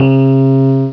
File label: Elect. Piano